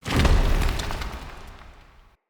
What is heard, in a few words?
Shrine Temple Door Close A; Glades